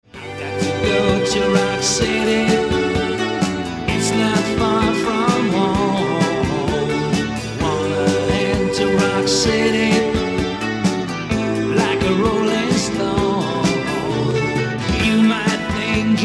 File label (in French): Disque 45 tours Rock